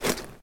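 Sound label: sound when a backpack is thrown onto the shoulder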